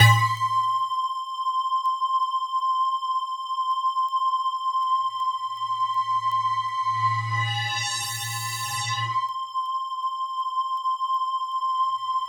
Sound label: C5 FM, synth line; 90 s Sample CDs; NiceBeats; SynthLine VOL-20